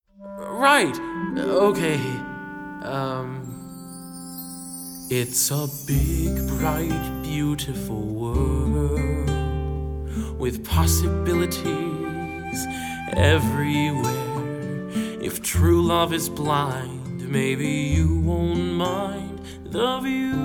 Vocals

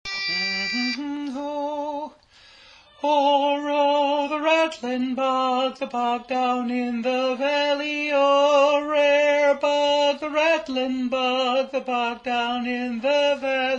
Tenor; Rattlin Bog

